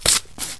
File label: SHOVEL